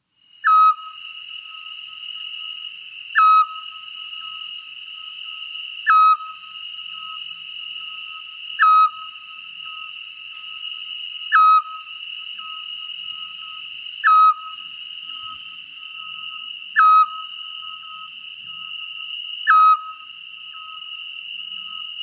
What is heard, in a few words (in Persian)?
مرغ حق معمولی